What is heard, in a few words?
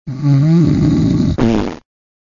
Human Snore Fart; Motorola E398; Hijacked by MTV